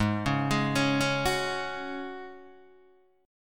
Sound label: G sharp 7th Suspended 4th G sharp, Guitar DADGAD